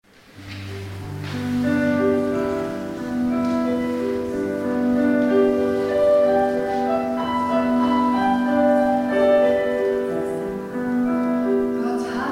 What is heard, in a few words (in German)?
Halleluja Taufversion